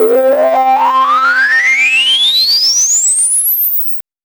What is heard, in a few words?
FILTER R; AMG - Vince Clarke Lucky Bastard